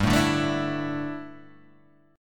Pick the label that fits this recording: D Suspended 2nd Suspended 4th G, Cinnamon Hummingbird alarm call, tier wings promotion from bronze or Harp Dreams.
D Suspended 2nd Suspended 4th G